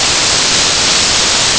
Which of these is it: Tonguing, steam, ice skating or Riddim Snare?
steam